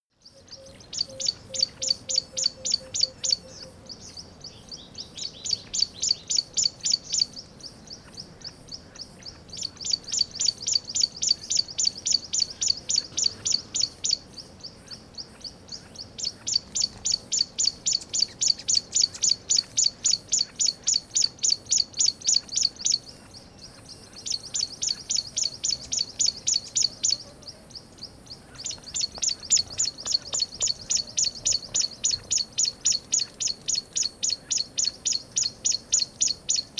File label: river day bird call